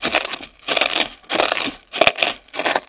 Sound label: crunching